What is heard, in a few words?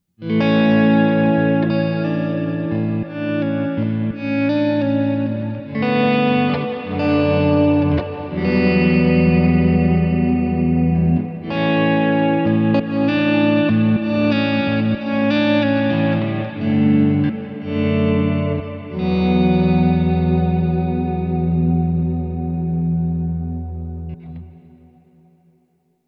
Strymon Big Sky Plug in Slumber Swell Hardware